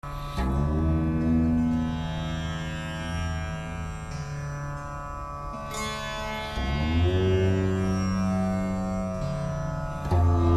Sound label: instrument rudravina